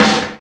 good snare sound g key, snare single hit tuned to the G note, Loudest frequency: 1710Hz